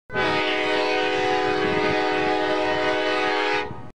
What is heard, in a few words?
train amtrak horn